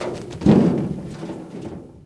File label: Metal Climb On Dumpster; Foley - Rattles